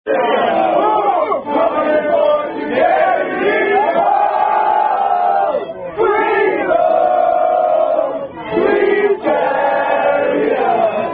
Rugby Social, Swing Low Sweet Chariot With Gestures